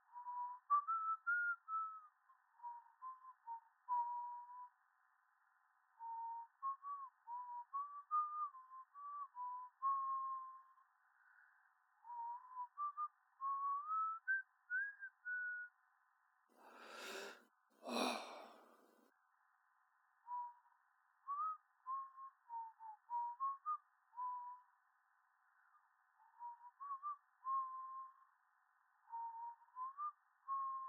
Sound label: Whistling And Sigh